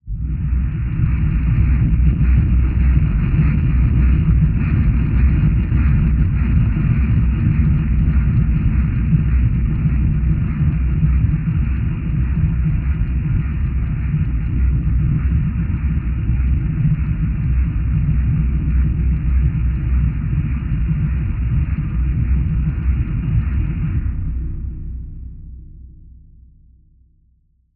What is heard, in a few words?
bass bed heavy, drone; 3maze